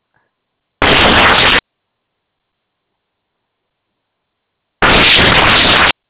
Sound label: hum